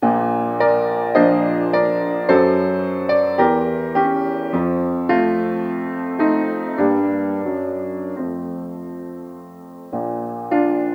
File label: karaoke; 校 歌